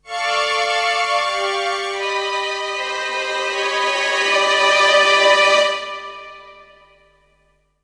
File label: Shooting Star (Night) Jingle; captain rainbow; wii